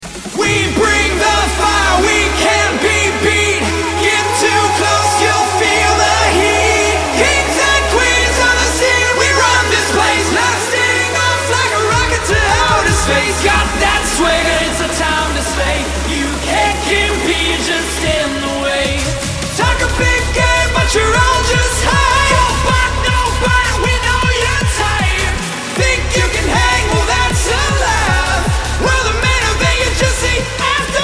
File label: Sample, male singers